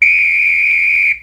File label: Whistle